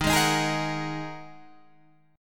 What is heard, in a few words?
A sharp 7th Suspended 4th Sharp 5th D sharp